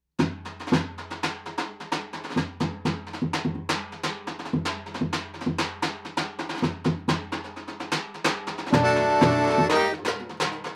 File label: accordion mic